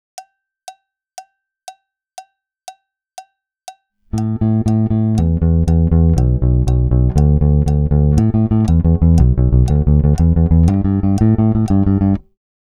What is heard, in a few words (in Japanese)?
指弾き練習フレーズ